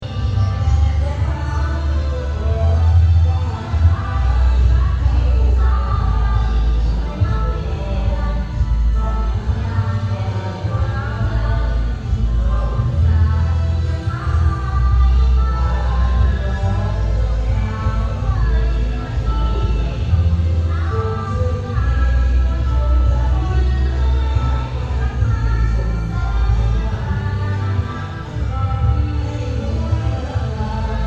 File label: fullmoon